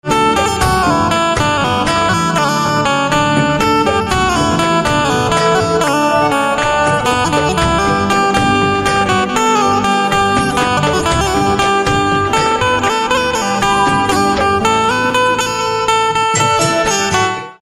Banjo

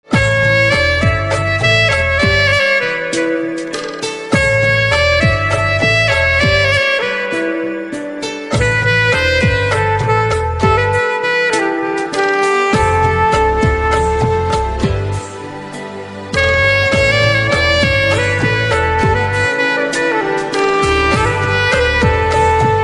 Saxophone; instrumental ringtones

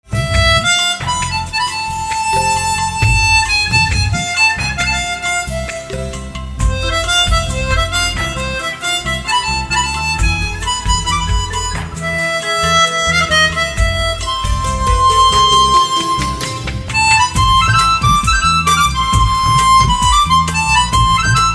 Harmonica

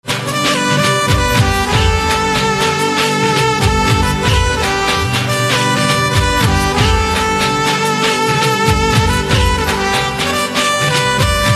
Saxophone Instrumental Ringtone

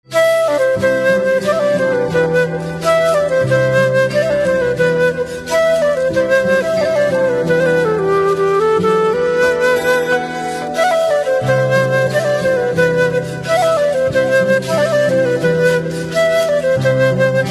Flute

